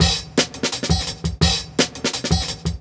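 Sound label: Jungle Breaks, 170 bpm; Graded; Original Jungle Breaks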